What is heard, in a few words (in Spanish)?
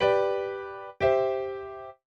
Cadencia imperfecta